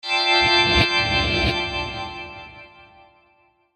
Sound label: element ice; maoudamashii